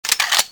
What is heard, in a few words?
g11 clip in